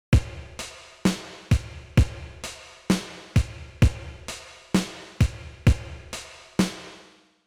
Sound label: Drums Vintage